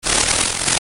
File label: shuffle; Fruit Poker; fruit card poker